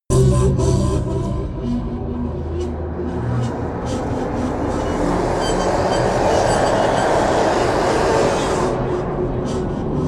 atmosphere attacking low

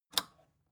record player power release off

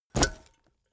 leather metal bodydrop

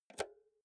insert jack multi socket quick

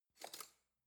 cutlery spoon drawer grab

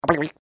funny babbling

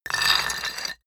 stone tile scrape high

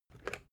water boiler pick up

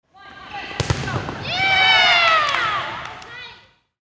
volleyball attack blocked

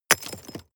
drop stones clack

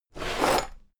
drawer cabinet equipment open heavy halfway

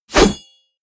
blade whoosh medium

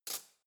cutlery spoon drawer place

